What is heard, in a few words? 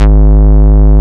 Bass, Root G#; Vengeance Samples